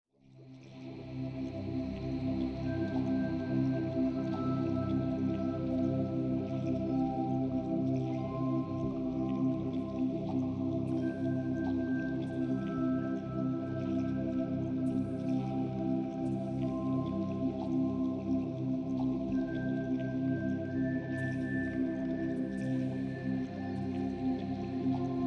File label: Sounds of Nature White Noise